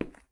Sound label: footstep